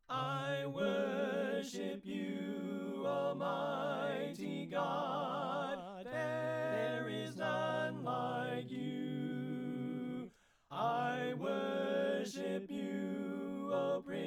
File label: Alto Left